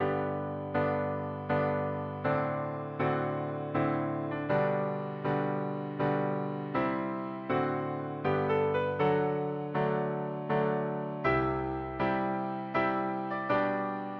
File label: handel xerxes, oboe